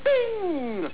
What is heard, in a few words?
short bing